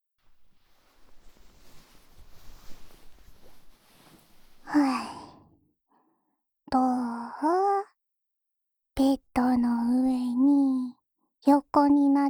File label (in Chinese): 耳刷套装 (右耳); 一举两得; 魔族×按摩×世界征服 ～库努姆小姐的健康乐园～